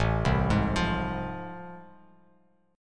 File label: A Minor Major 7th Sharp 5th A, Bass Standard; AmM7#5 arpeggiated; 5,3,6,10